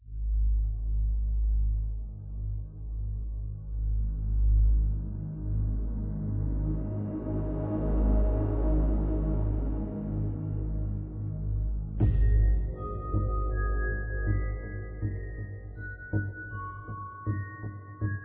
Timer; Lower Akasha

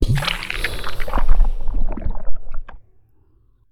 We Are the Dwarves

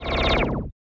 Teleporter Fade Out

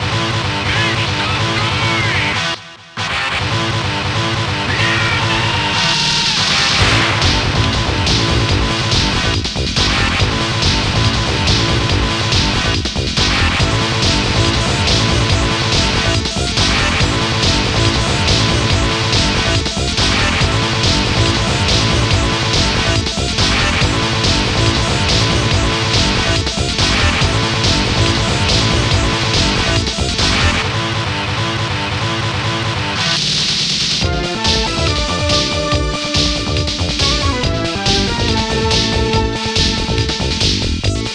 Battle Loop

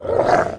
alerted, Wolf

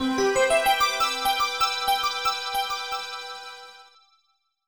Jingle Star; Super Mario 64 DS; NDS

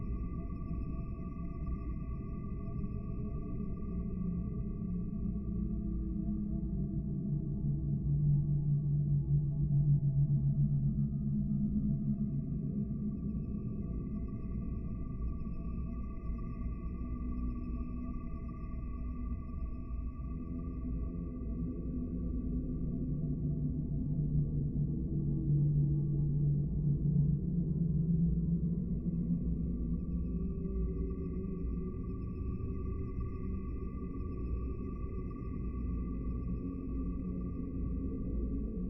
Card Table Amb Tonal Loop

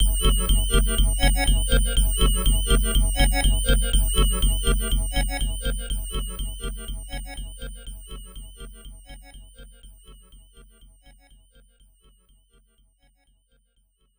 Name Regist; Rastan; arcade; Bad Bible

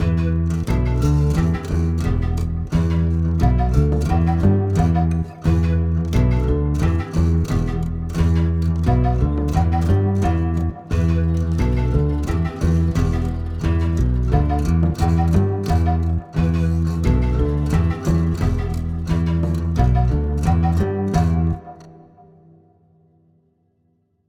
drumulus singalongus F; 3 sek hale

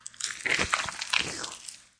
Payoff

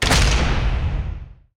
Drawbridge Bang Shut

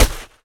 stomp dirt; Scrat

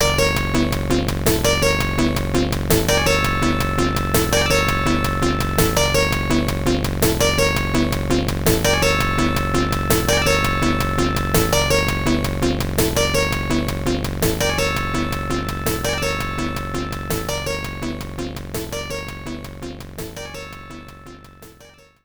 Prelude to Boss; Dynamite Duke; x68000

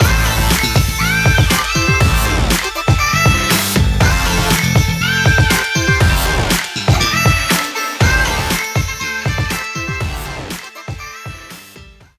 Stinger; Champions Field

